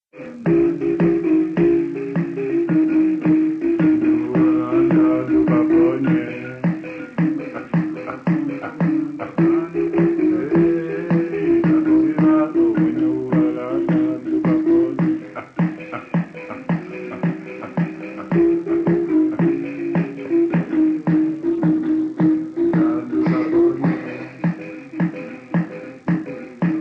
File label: Thumb piano